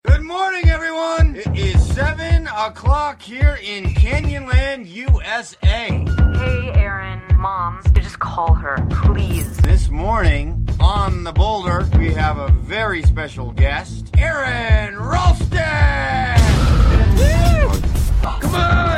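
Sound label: morning alarm ringtone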